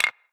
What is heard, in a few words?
Close Button; Android; Portal Quest